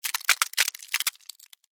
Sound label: Weather Ice Crack; Snow